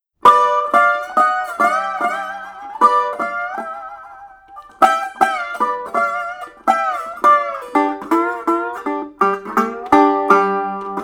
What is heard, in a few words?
Slide Mandolin